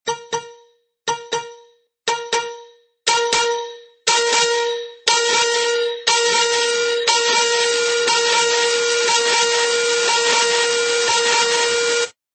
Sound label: Overdriven